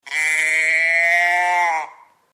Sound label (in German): Schafstimme klein